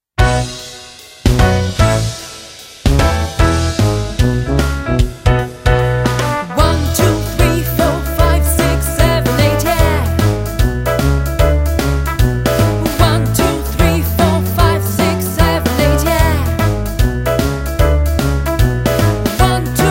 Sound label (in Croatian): Rock razgibavanje vokal